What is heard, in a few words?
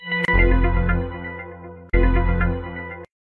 Suspense Short